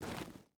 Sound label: footstep boots gravel walk; Rust